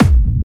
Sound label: Medium, kicks